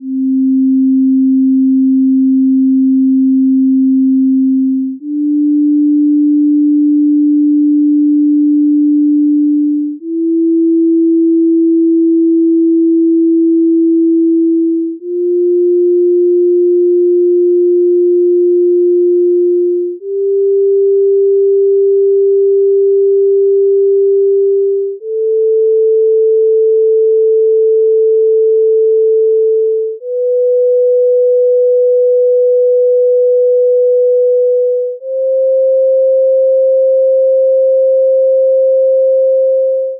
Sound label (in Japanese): 正弦波1本による音階; 付録D：音階・音律; 各種音律